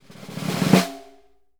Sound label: SNR WR CRS, Synclavier Percussion; ILIO